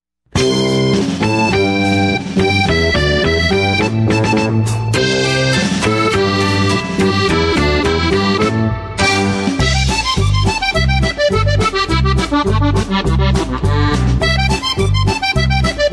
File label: chinoise polka